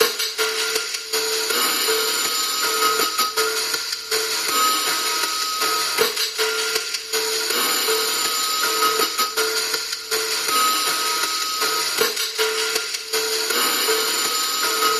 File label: Pulse Double Drops